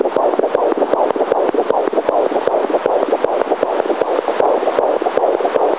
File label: 16 week heart; Obstetric and Newborn Care